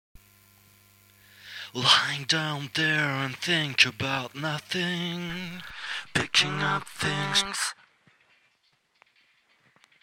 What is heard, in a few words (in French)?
voix